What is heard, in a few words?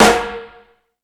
Metal Drums, E-MU Pro-Cussion; drum machine